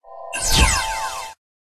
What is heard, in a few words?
Light Spirit